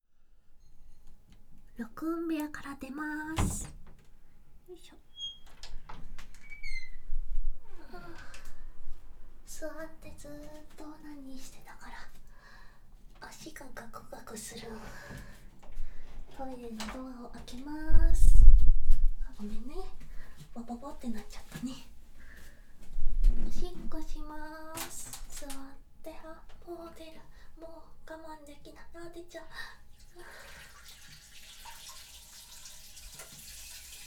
おしっこ, ASMR

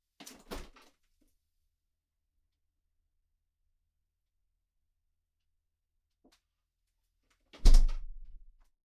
冷蔵庫開閉; 3DIO; 食事に関する音

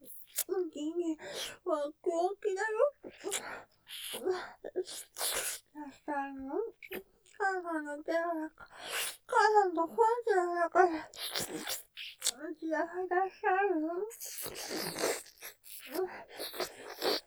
舐め; おちんちんばっきばっきだよ♪; ステレオ; ループで楽しむ！シェフの気まぐれ抜き抜きコース

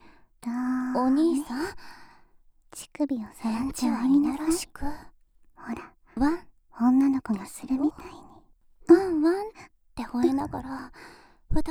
喘ぎ, ASMR; 同サークル他作品（体験版）; 堕天使の快楽〜危ないメスイキの誘惑〜

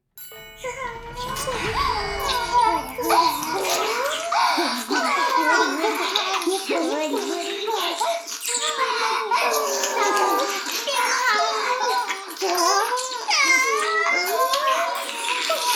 耳舐めフェラ音声, ASMR; 停止解除しこしこ耳舐めフェラ音声; LR逆ver; 逆時間停止されてストーカー後輩に

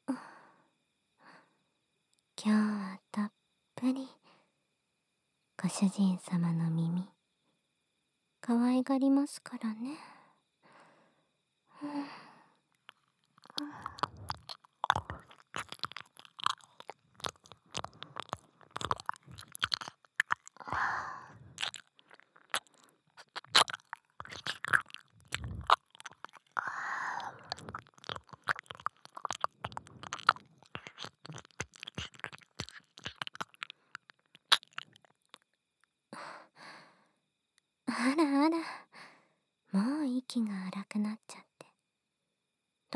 耳舐め 左

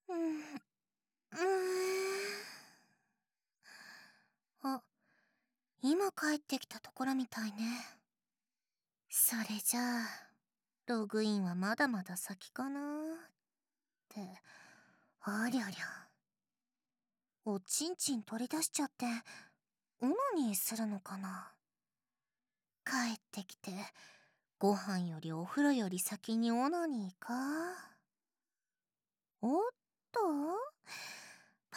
相互オナニー

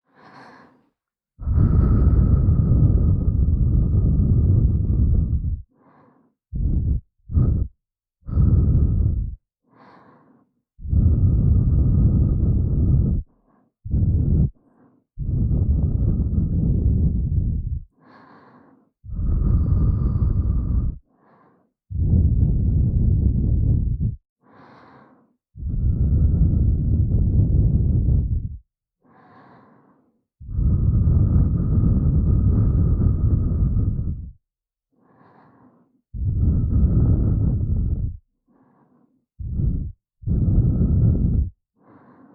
右耳ふー; 耳舐めタイマー編

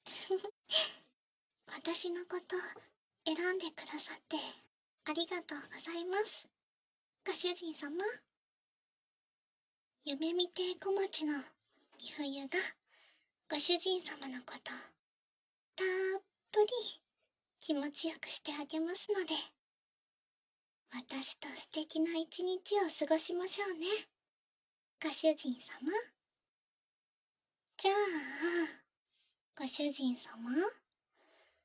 耳タオルマッサージ, バイノーラルマイク収録 効果音あり

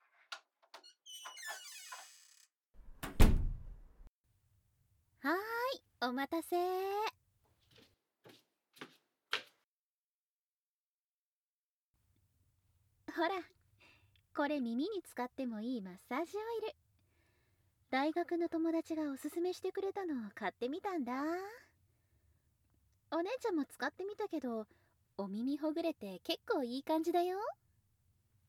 ぬるぬるオイルマッサージ; 安眠

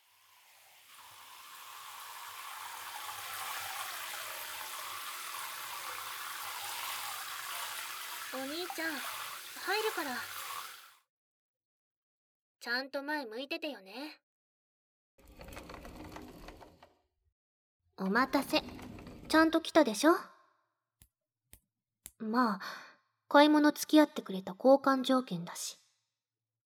シャンプーと炭酸トリートメント; なんのかんの甘やかしちゃうJK妹真綾ちゃん; シャンプーと炭酸トリートメントしてあげる♪

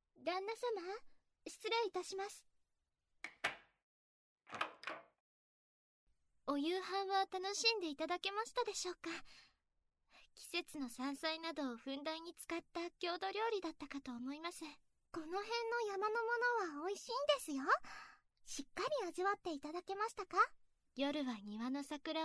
耳舐め; 夜桜月見で

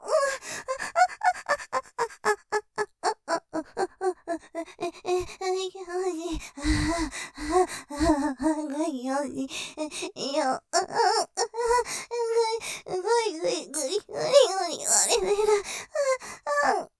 喘ぎ ふやふや・とろとろ感; おまんこ気持ちいい; ループで楽しむ！シェフの気まぐれ抜き抜きコース

